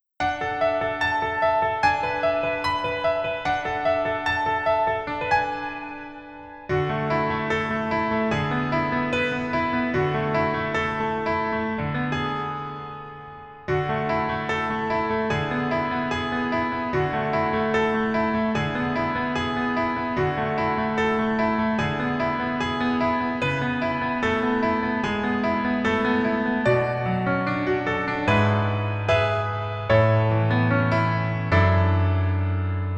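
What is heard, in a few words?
Piano version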